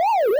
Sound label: siren; svencoop; w00tguy; arcade; pman